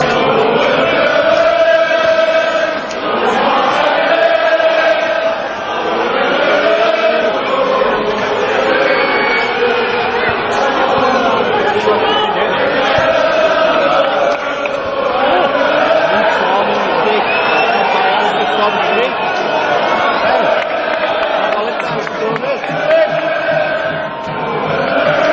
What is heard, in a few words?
when the reds go marching in slow, fanchants